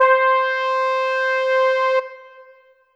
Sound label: Yamaha EX5 Smooth Brass C5